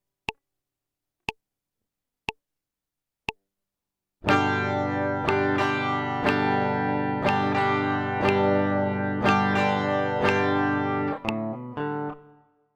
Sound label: intro melody g g, guitar